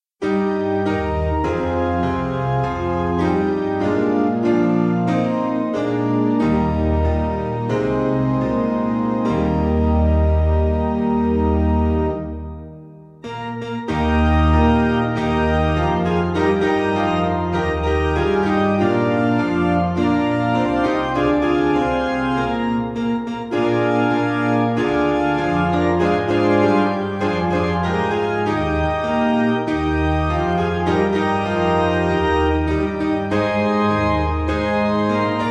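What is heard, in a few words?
Piano, Free Music Backing Files for Churches; As The Eyes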